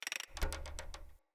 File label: Foley Winch Tension Wobble; Enemy; Nimrod